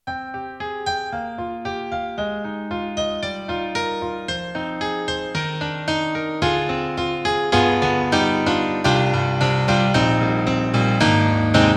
Piano; EDIROL SD-80; Enh.Piano 2

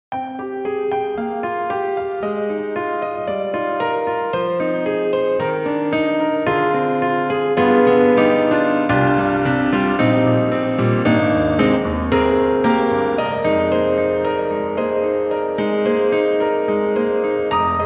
Grand Rhapsody Piano Wet Grand Hall; Waves

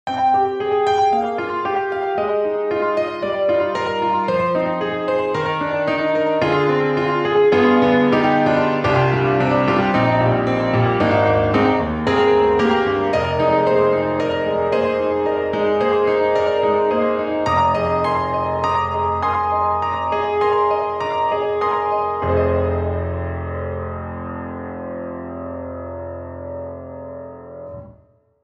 Grand Piano A Distant Flange; Waves; Grand Rhapsody Piano

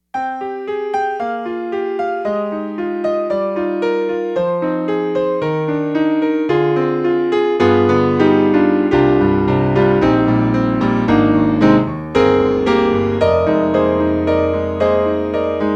Roland U-110 A.PIANO1

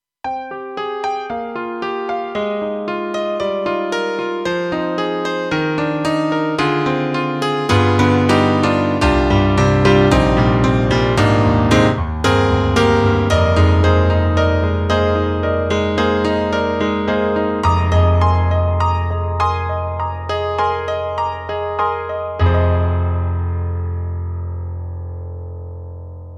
New Piano; KORG M3 XPanded Program; SG-1D

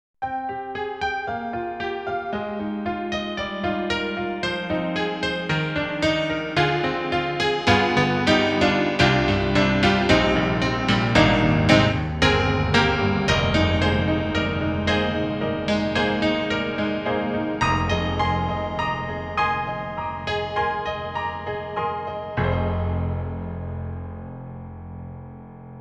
EDIROL SD-90, Classical, Honky-tonk

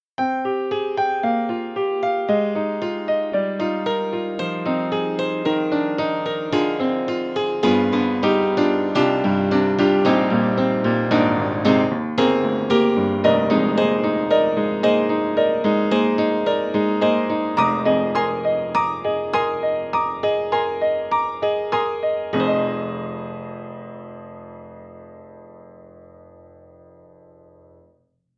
Upright Piano Close Rhythm and Blues; Steinberg The Grand 3

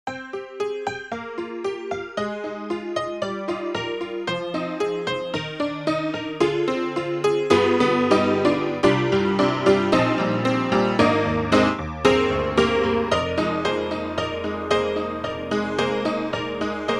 Honky Tonk; IK Multimedia; SampleTank2.5XL

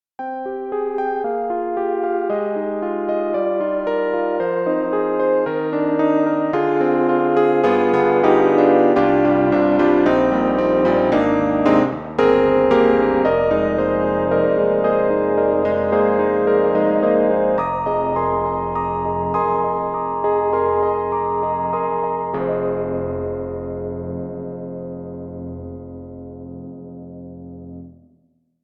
Yamaha CP80 Moaning Chorus; Steinberg; The Grand 3

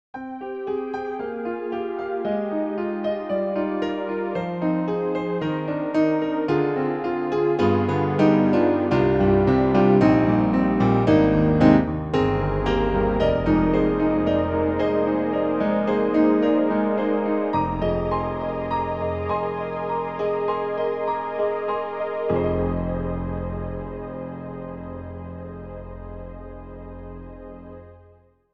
Padded Piano

